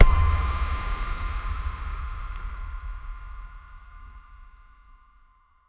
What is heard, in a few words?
impact